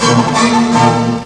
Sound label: musical joke, cartoon sfx